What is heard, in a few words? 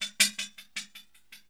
HIHAT, Drum Bass, 160; eJay